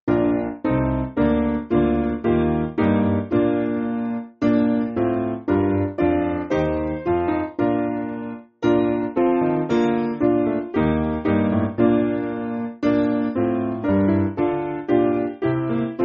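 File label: Piano, Backing Files for Churches; Jesus Sun And Shield; Coldrey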